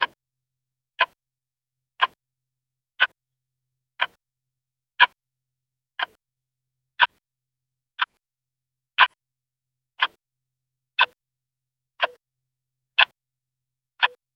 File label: ambient, clock